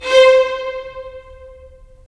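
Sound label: strings violins; Roland - LA Composer vol. 4; Violins Sh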